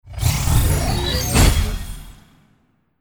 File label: Loot Box In; lol air client; Loot Project